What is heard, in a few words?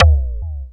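FM kick; Optical Media International; Sonic Images Library; SI FX Vol 13; Instrums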